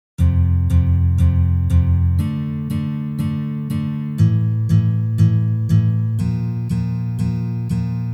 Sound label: Udary vverh Upstrokes